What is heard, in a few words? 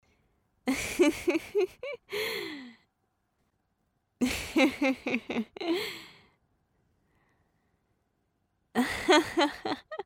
Laughter Joke Version